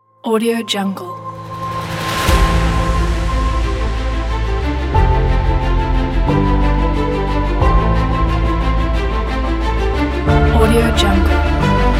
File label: atmospheric epic; studiokolomna